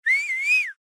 Whistle Mouth

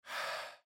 Exhale

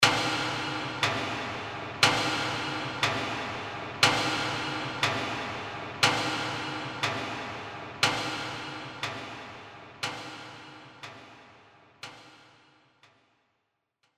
Clock Tick Eerie

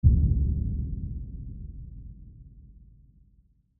Burst Rumble

